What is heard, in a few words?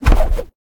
arcane; smite; god merlin